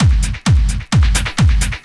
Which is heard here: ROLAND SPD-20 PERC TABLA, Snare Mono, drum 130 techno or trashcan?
drum 130 techno